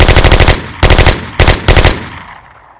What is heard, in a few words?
weapon